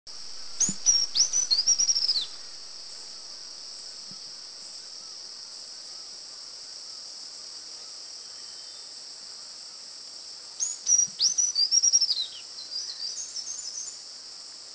Yellow-throated Fulvetta; Mishmi; India

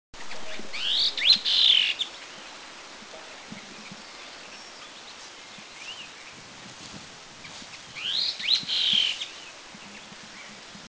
Chestnut-tailed Minla, Eaglenest, India

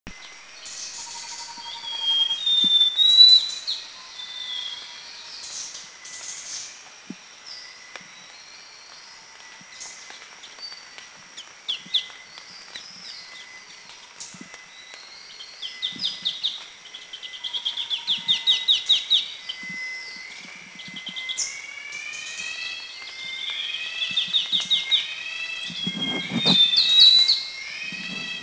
Scaly-crowned Babbler; Malacopteron cinereum; Thailand; Krung Ching

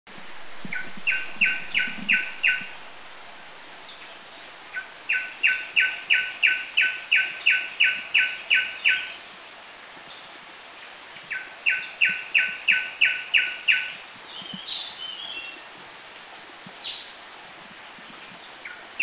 Striped Tit Babbler, Thailand

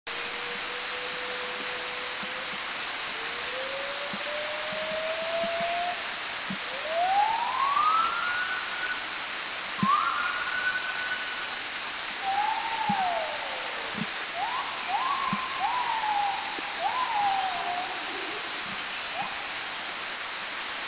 White-handed Gibbon

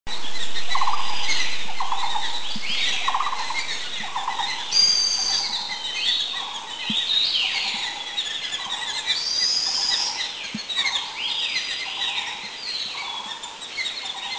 White-throated Laughingthrush Black-browed Barbet

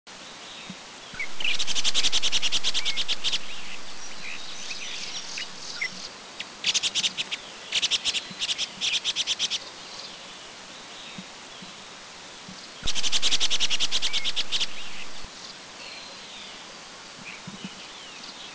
Vinous-throated Parrotbill, Taiwan; Paradoxornis webbianus bulomachus; Chung Yang Farm; 4 birds at Chung Yang Farm

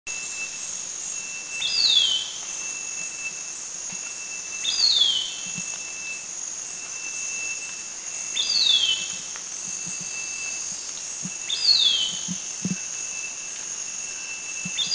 Yellow-wattled Bulbul; Philippines

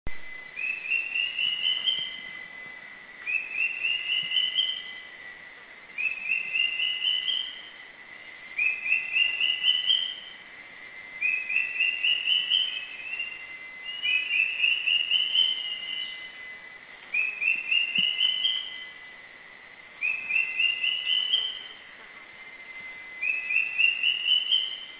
Drongo Cuckoo

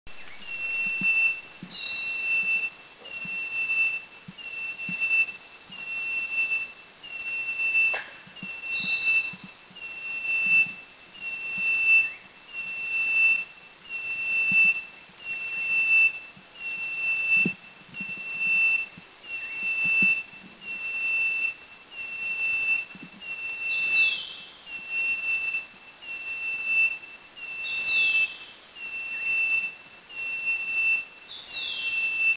Yellow-breasted Tailorbird; Philippines

